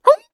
double jump; Scrat

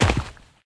Footsteps; SFX Character

